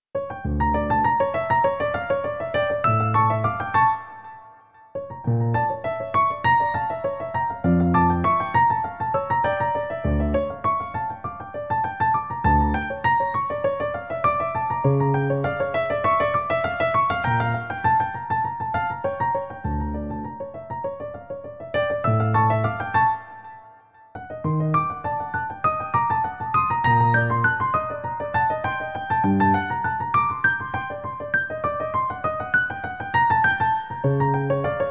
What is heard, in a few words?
Classic Piano